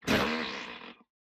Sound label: Fart Noise; SoundsCrate